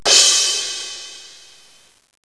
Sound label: cymbal; Music Game